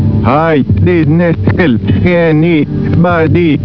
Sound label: DOPPLE; Twin Peaks